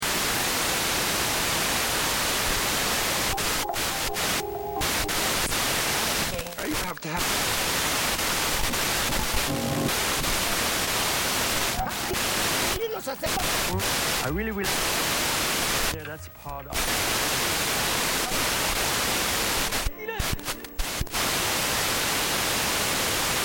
TV UHF Tuning Slow: Television tuning, white noise and snow; Quasar; short bursts of various TV channels; Noise Sound Effects